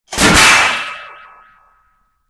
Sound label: Metal Impact Wwarble, pots & pans